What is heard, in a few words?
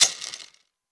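FX SHAKER; AKAI S-Series CD-ROM Sound Library VOL-5; FX PERC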